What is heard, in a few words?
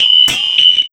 Bumpers